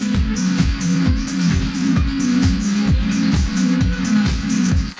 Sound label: techno